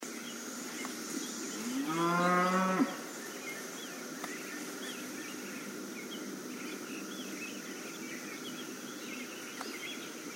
Cattle

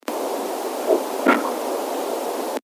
Grunt; bigfoot

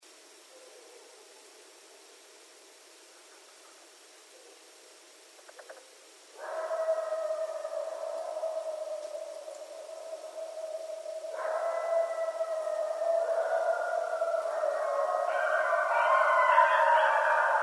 Coyotes; Coyotes (Canis latrans)

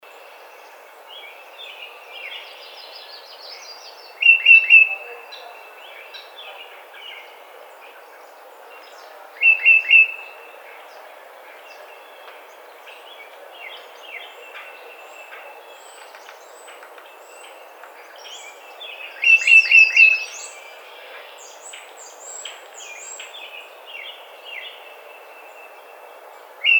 Tufted Titmouse

